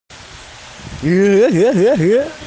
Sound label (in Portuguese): risada debiloide